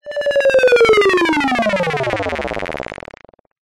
sound design descending stutter tone

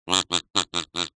cartoon duck quack

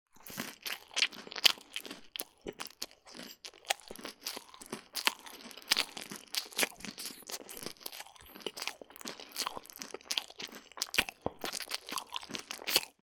human eat food close wet disgusting; sound effects one

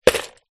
shells small stones plastic bucket down on ground; foley

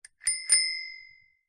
vehicles bicycle bell ring lever; zapsplat

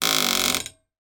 household stair gate hinge squeak creak; sound effects one

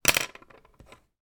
office pen ball point biro drop on table; sound effects one

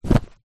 household books pile set down on surface

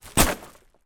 trash bag full down on hard ground, household

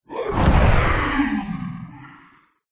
horror monster creature impact growl squeal

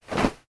foley clothing denim jeans land floor; sound effects one

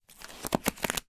paper pack flick through sheets; foley

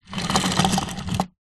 toy train wooden roll surface short

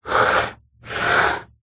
technology computer mouse apple magic slide table

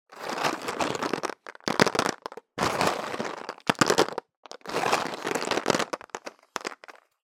food chocolate handful take from box drop back in; maltesers; zapsplat

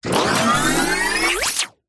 science fiction robot power up surge; zapsplat

